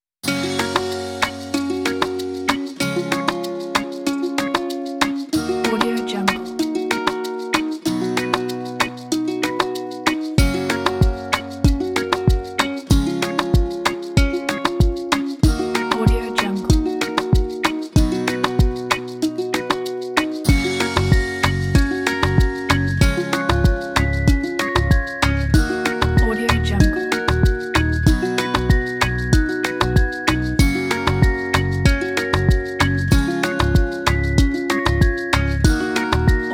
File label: easy acoustic